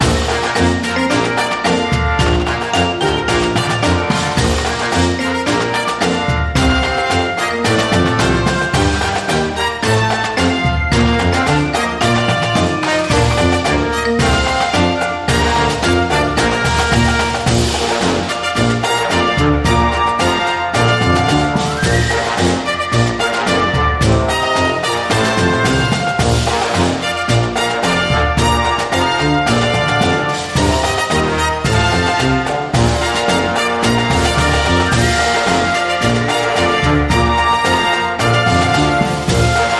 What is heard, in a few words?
Halftime Show